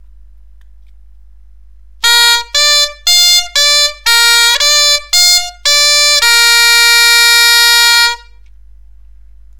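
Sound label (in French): Arpège si ré fa, bombarde; Si/g Ré Fa Ré Si/g Ré Fa Ré Si/g Si/a Si/g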